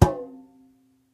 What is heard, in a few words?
item paint can pickup